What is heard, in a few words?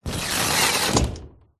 door sliding glass close